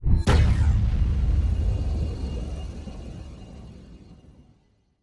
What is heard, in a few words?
pulsar planet; Stellaris; machineage; planets